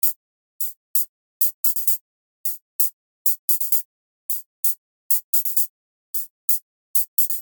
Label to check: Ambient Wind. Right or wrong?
Wrong